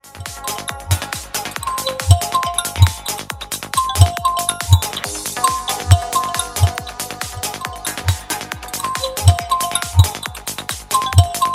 Vivo iQ00 Z3

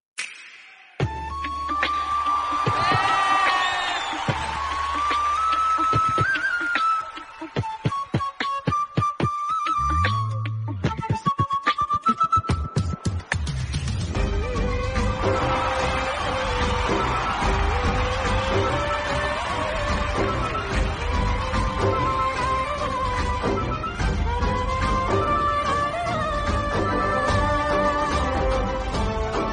Song Flute; Sairat

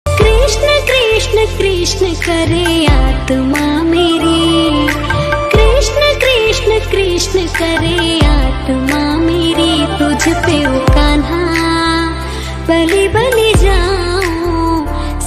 instrumental; devotional ringtones

